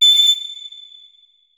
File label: flute notes; Hideout Furniture